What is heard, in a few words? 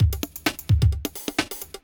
electro kit 130